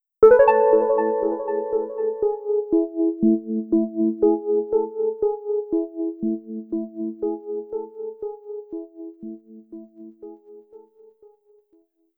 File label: Clock; Wii Speak Channel Soundtrack; nintendo